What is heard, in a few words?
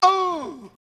"oh", male; ooh ahh